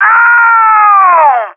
player aaaaoooo; allegiance

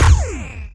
plasma rifle; allegiance

